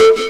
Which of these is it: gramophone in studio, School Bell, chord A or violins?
chord A